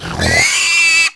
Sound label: Hit; PC Gamer IT CD 45; SMAN DEMO; baddys; brutal